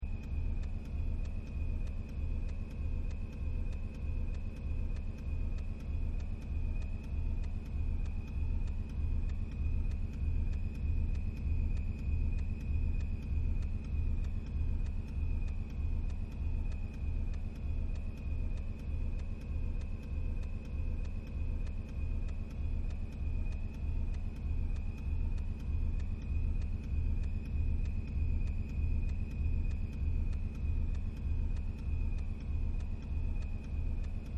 Spaceship Roomtone, Ufo And Spaceship Roomtone Loop; Spacecrafts